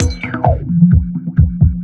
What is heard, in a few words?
130 DISCO; USB Soundscan vol.20; Fresh Disco House I; AKAI; DISCO ADD